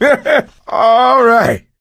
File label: positive noise; voice; source engine; left 4 dead 2; survivor